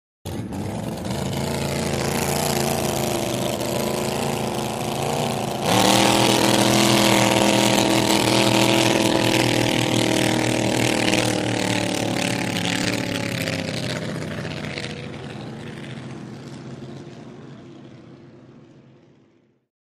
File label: Boat In Out, motorboats